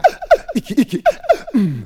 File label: VOX SUNG; AMG Megabass REMIX! VOL-2